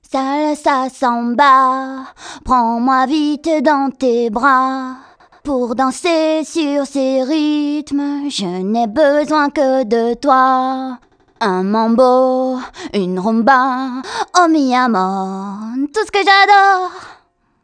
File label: LATINO; MOBICLIC 57